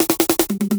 Fills 150BPM; Eggnog

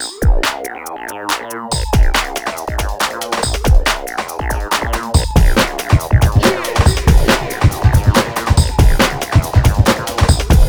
Ditty; NBA Inside Drive 2003; Xbox

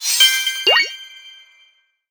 Rankbonus

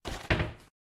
Swing Cupboard Close; Lethal Company

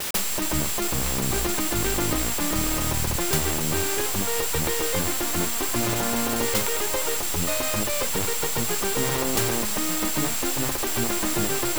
Team Jingle

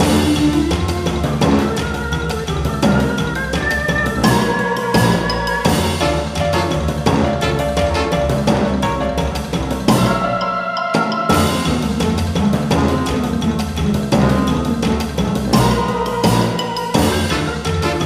Summer 170bpm; Don't Starve Giant Edition; PS Vita

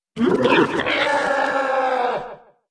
Death; Great Pictator Death; Aporkalypse Pigs of Doom

